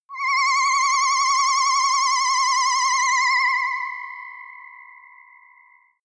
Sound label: hovering ufo